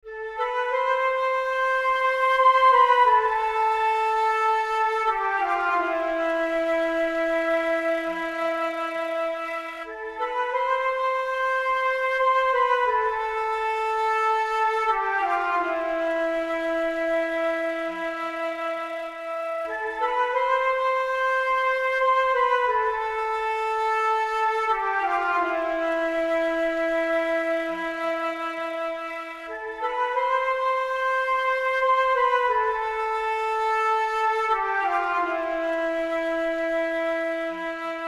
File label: dramatic flute